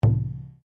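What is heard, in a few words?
Drum Taiko piano, Japanese Taiko Drum; Miscellaneous Musical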